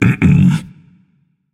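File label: non-verbal disagree; smite; atlas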